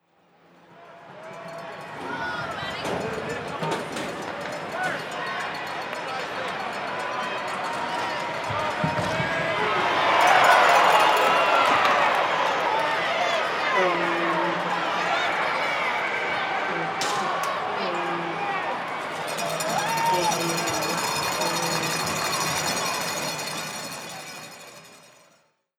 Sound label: crowd ambience